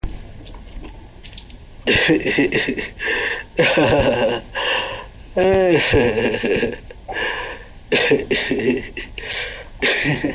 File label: Laugh, workers laughing alone for money